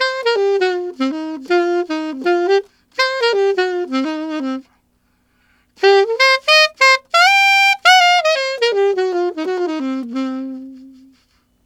Alto Swing Shuffle in C7, Sax; Sonic Foundry (Sony Creative Software)

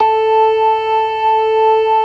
LOW MAN, LOWER MANUAL, Organ; InVision Interactive; Keith Emerson; The Most Dangerous Synth and Organ

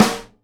SNARE DAMP, Live Snares; PlatinumPhattCD1; E-MU Producer Series Vol. 8 – Platinum Phatt

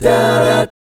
B13B5, GUY CHORDS, Vocal, Jazz; ILIO; Vocal Planet VOL-3; Jazz & FX

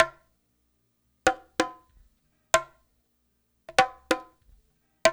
BONGOS; East West; Funky Ass Loops

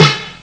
snare d; ST-XX Amiga Soundtracker Sample Packs

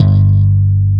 5STR BASS G1, Bass Guitar; AKAI S6000 CD-ROM - Volume 1

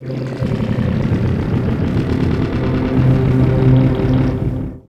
ambiant, special FX twisted; USB Soundscan; AKAI

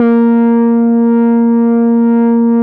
A#4, Bass; E-MU Producer Series Vol. 6 – Analogia Project; Boom da Bass

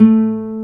G A2, NYLON; AKAI S-Series CD-ROM Sound Library VOL-1

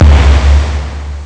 fat drum; Amiga Soundtracker Sample Packs

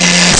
machine; Amiga Soundtracker Sample Packs

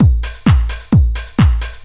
drum 130 straight; Techno Trance Essentials

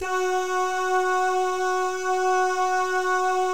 DAH, Vocal Jazz; ILIO

